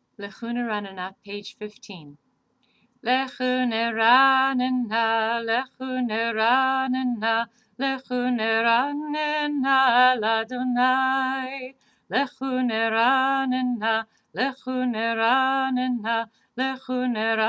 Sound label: upbeat melody; LChu Neranena